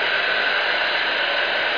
steam

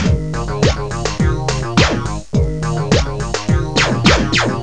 BGM; PATRIS

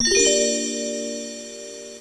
Music; Princess and the Pea; SoftKey

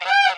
creek; Ostrich; OstrichRunnerDemo; CyberMycha 2003 October

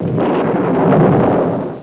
mine Die; F.A.R.M. Patrol

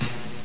ting; duke nukem total meltdown

